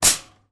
foley shopping basket metal drop into another

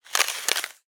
nature thin ice crack under pressure

